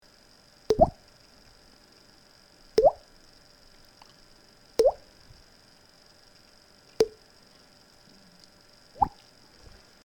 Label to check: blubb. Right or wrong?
Right